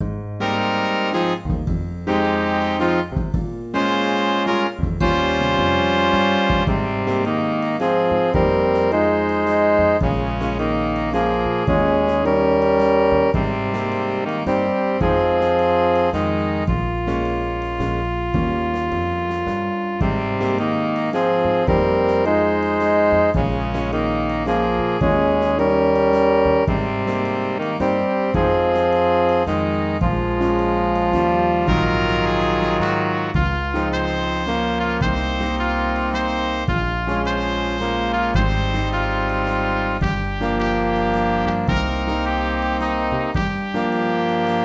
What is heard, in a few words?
4 Horns Vocal; SWJ 4 Horns